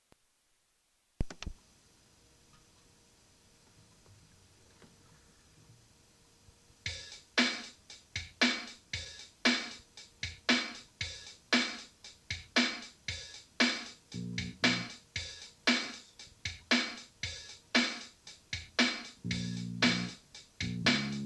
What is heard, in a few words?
instrumental